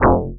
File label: ring, rhythm; nyquist